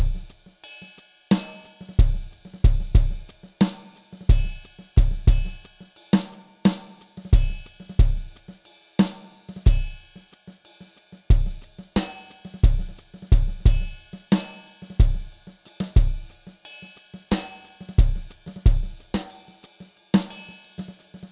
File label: centered drumloop 90